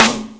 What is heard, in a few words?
Raw Snr; Rawcutz - Nu Forms